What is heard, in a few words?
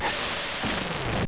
car brake